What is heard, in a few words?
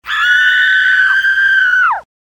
scream